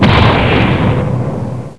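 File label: EXPLOSION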